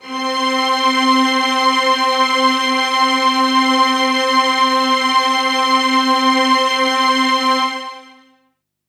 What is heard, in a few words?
Synths HiString C; Vengeance Samples